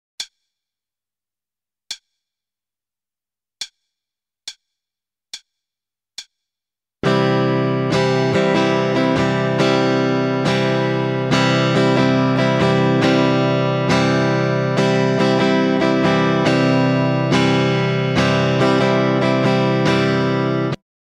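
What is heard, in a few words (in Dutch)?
Ritme, gitaarstarter; Meespeel CD; Slagritme Schema 2; Slaggitaar 2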